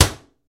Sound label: Cabinet Door Slam Cl